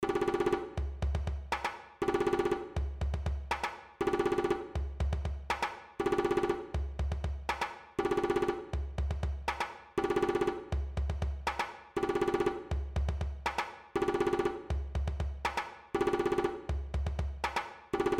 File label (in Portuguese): timba